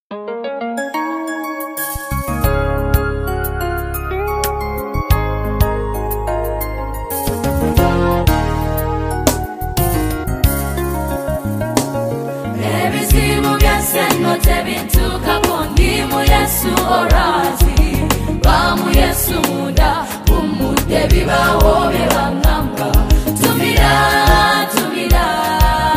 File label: Choir